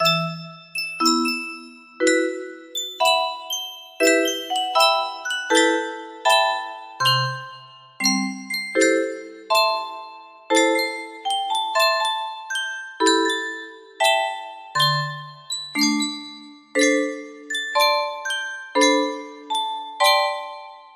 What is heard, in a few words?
music box, music box melody